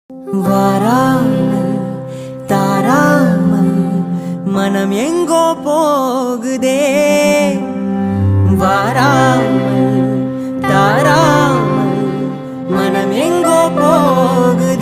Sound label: tamil song